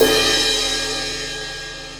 19 inch medium crash; cymbal; mb8; Meinl

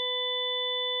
even order with lower evens higher seventh

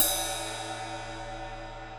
21 inch ride; cymbal; rezo; Zildjian

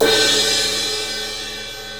18 inch crash, cymbal; aax x-plosion; Sabian

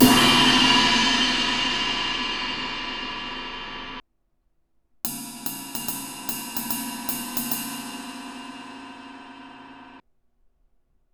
24 inch half cup ride crash ride bell; Dream; bliss; cymbal

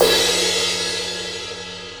18 inch medium thin dark crash crash; cymbal; Zildjian

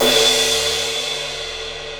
19 inch medium thin crash crash; cymbal; byzance; Meinl

